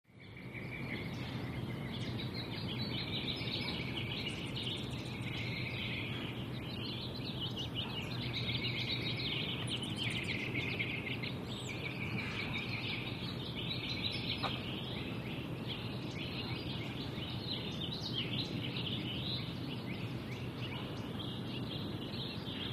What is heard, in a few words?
Ambience: Morning Suburban City Birds.